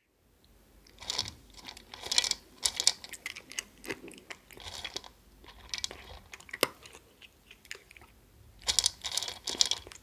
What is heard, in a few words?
Cat Eating Dry Food; افکت صدا حیوانات; Animals